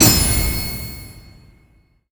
counter success heavy

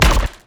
gavel

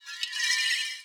harp bow cast

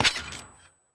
swo mvmt

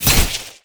sword cut explode

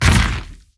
dragonfire

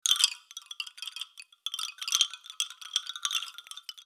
wooden sign

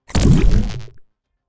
atk sea wave

